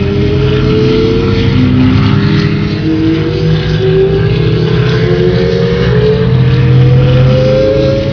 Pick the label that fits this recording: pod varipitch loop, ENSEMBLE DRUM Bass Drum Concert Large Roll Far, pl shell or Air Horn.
pod varipitch loop